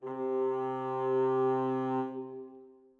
bassoon leg C3, Woodwinds; BBC

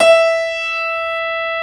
GRAND MF E4, PIANO

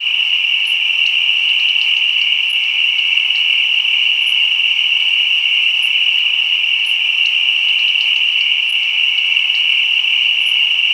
CRICKETS HPF; Spectrasonics; Heart of Africa 1; JUNGLE FX

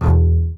SPICCATO, ARCO BASS; Northstar - New Gold VOL-2

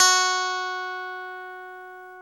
F#3 Strat, clean; InVision Interactive; Lightware VOL-1; Instruments & Percussions

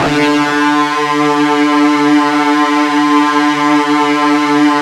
FUZZ D 2, Guitar; East West; Steve Stevens Guitar Samples Collection

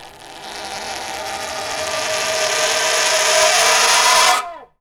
Sweeps Up, Effects

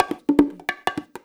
FILL, Percussion

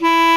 Clarinet & Bass Clarinet

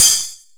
Bass Open Hat, Jomox Airbase99; Drum Machines

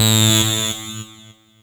saw lead synth

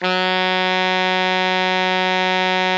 ALTO MF F#2, Sax; Greytsounds; Philip Wolfe David Crozier's Saxophones

